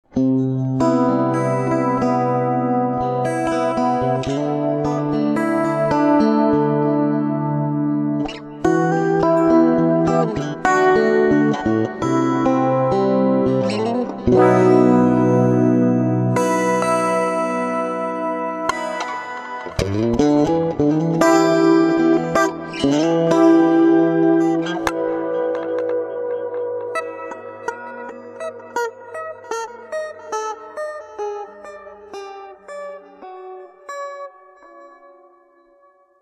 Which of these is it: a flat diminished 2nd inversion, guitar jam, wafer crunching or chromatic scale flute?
guitar jam